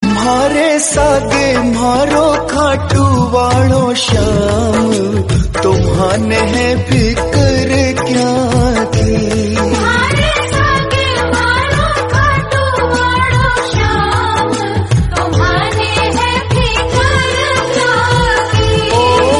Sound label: Gujrati Devotional